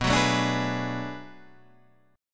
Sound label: B Minor 13th B Guitar Half Step Down, chord